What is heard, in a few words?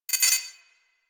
Tech One Off, Ambience; Warframe; Rail Jack